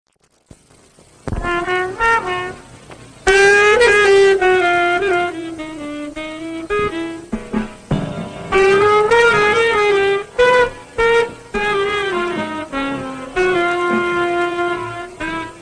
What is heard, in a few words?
half tempo